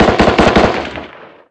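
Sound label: gun m16 medium; ModernWarfare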